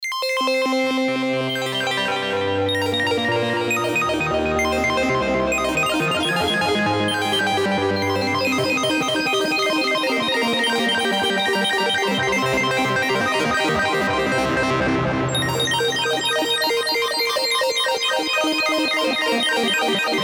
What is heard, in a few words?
Tone2 Icarus